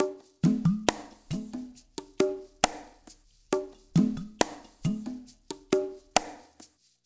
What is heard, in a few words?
PERC, LIQUID; Spectrasonics; Liquid Grooves 1 & 2